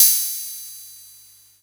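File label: cymbal, drum machines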